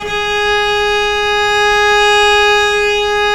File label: VL G#3, VIOLE, Historical Instruments; USB Soundscan vol.23